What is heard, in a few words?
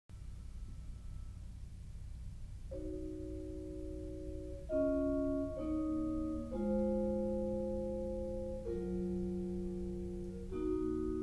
Chorale, marimba